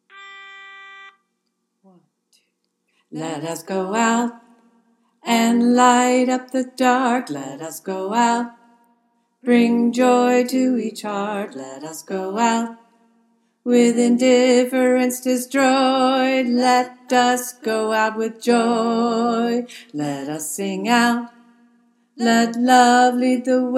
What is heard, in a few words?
tenor, choir; freedom choir